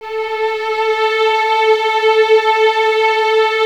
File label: A 4, String Ensembles Violins; Miroslav Vitous; 11 Violins